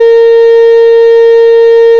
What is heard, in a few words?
fm voice single; FFTimbre